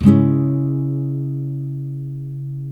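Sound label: NYLON, C# MINOR DOWN, CHORDS; Spectrasonics; Hans Zimmer Guitars VOL-1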